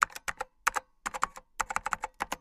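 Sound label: computer keystrokes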